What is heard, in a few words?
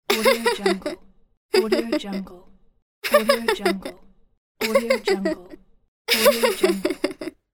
female child laugh pack